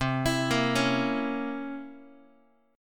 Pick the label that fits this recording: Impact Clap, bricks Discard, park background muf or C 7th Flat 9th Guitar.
C 7th Flat 9th Guitar